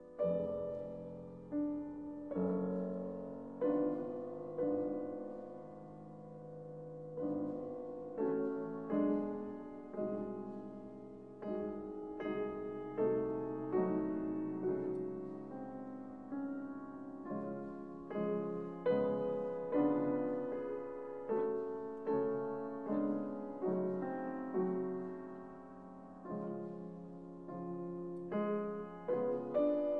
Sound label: Piano Sonata in B flat major Adagio sostenuto; No 29; Op 106; Hammerklavier